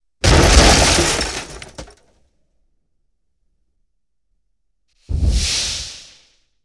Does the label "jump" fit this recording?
No